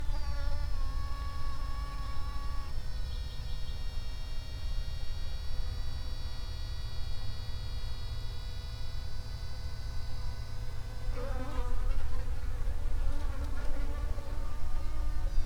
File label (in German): schwebfliege; was summt